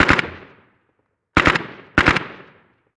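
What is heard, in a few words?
gun ak47 distant; Call of Duty Modern Warfare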